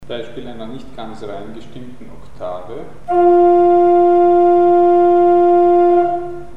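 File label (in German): sozusagen nicht ganz rein gestimmte Oktave; Mikrobuch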